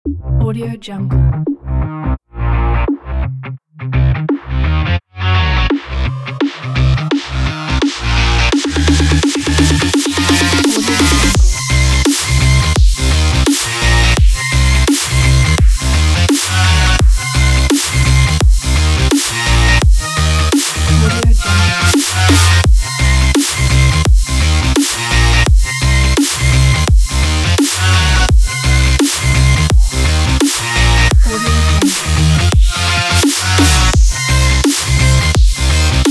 sport electro

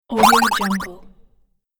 speedy jump

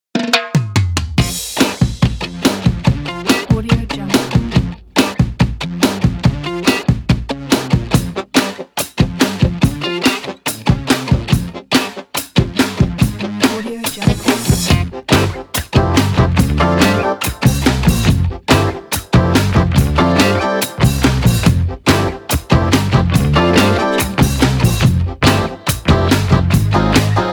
electric funk

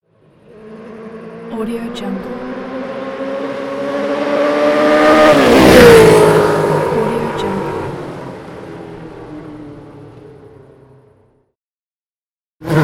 motorcycle

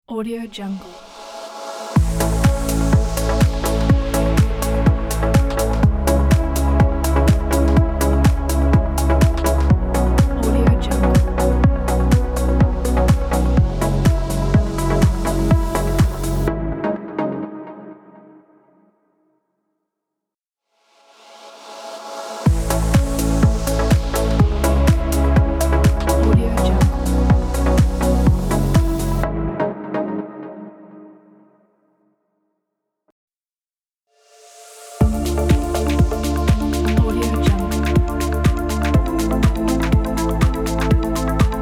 house logo pack

